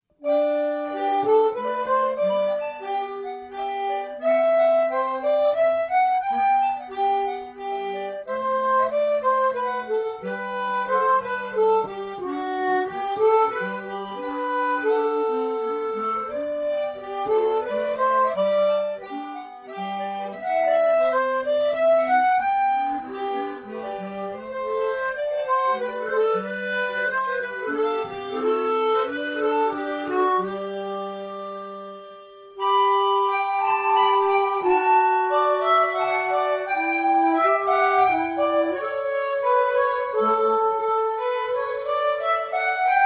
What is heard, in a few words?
Minuet G Bach, harmonica